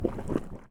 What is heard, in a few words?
Rollover Kitchen; Among Us